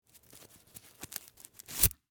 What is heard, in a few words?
Rope Tie Knot, Industry, Rope, Tie, Knot, Nylon, Rope; Hand Tools; BlastWave